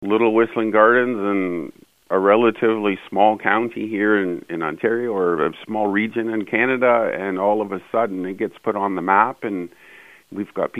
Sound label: whistling